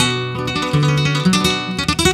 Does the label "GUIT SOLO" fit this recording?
Yes